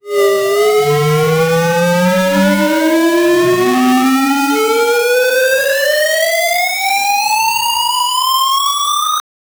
dudugi sound, domestic animals

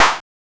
8 bit drum clap

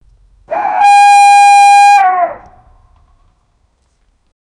sound of a bull, animal call

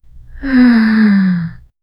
rolling eyes and sighing

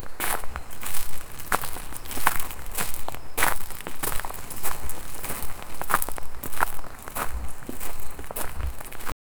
night cemetery footsteps, crunching

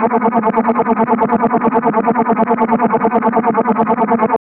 retro sci fi flying boat, synthwave

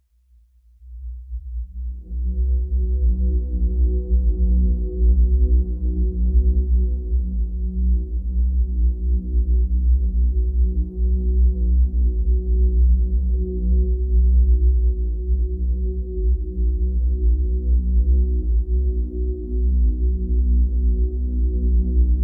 a brooding ambient score

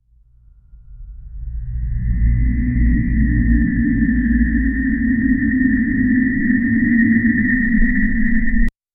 for background audio cree, japan

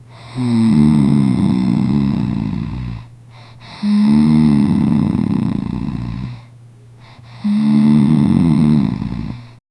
recreation auditorium a 1, sleep

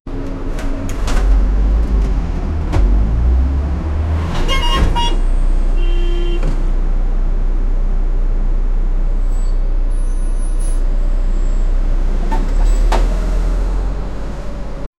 city bus door opening sound, city noise

